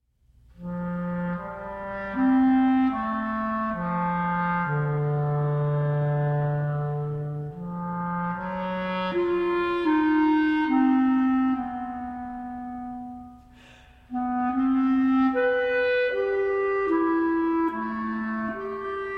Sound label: Wind Quintet, Interlude; Suite Madera II.